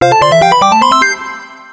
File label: time's up